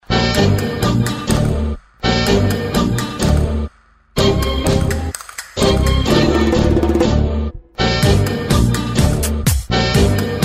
Tango

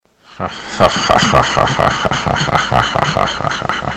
Creepy smoker laugh

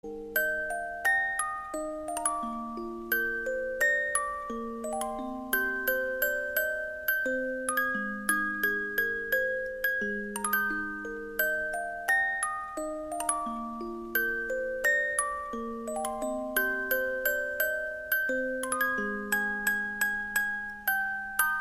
Music Box

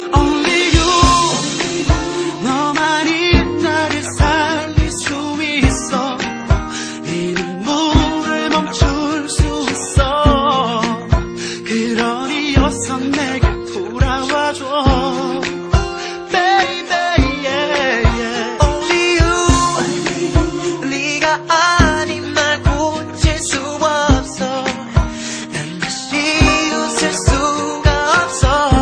Accoustic

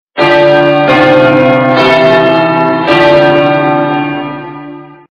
Church Bell

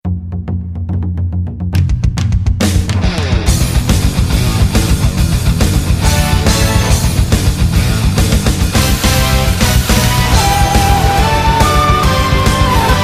Anime Mix

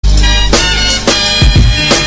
Trumpets

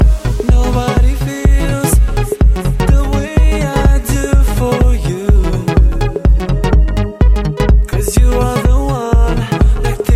Funky

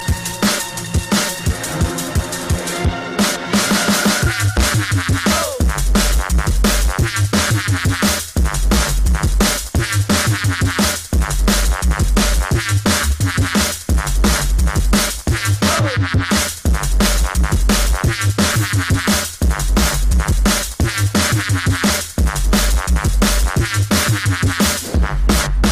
Ho Bass

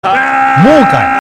grrr

